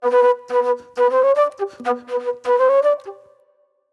Movie Flute

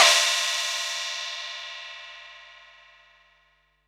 HH, Pearl SC-40 Hihats and cymbals

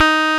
Roxichord, E.Piano; Roland LCDP10 Keys of the 60s and 70s 2

